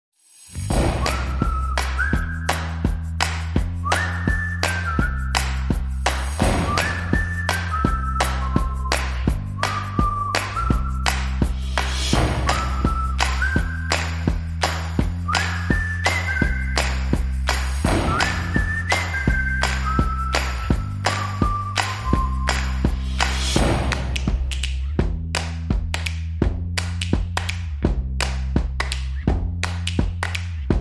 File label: Drums Whistles and Bass Only